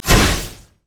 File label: electrical surge attack; LoL; kennen